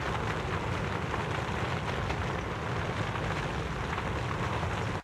Bossfight Hands fire loop; Level16; Veznan

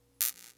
elec On; Undertaker's 2017 gamerip PC

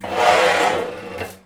Sliding Automatic Door Opening

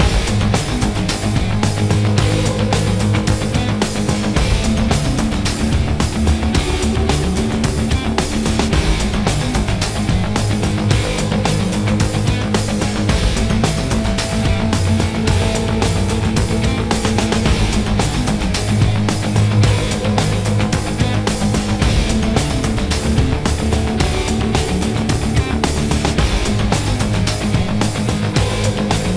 BGM Police

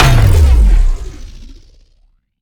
Sciweap Shot Large Blaster Gravity Disintegrator

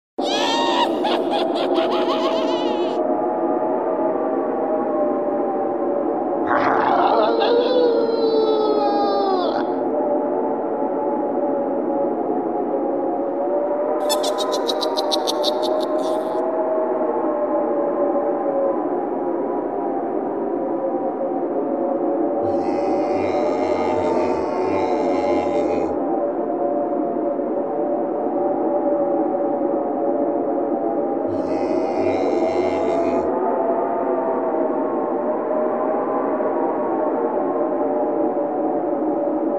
Manor Haunt Loop; Family Guy Back to the Multiverse

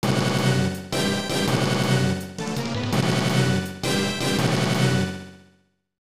Level Start; Macross Scrambled Valkyrie; SNES

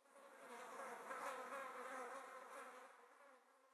Ambience Flies

